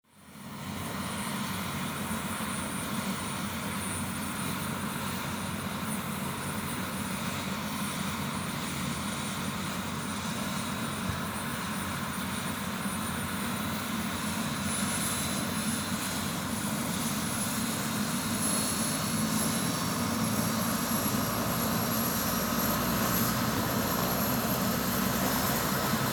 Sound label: helicopter starting